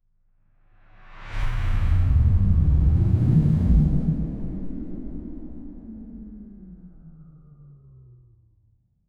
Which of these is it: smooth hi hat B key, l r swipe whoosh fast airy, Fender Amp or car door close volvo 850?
l r swipe whoosh fast airy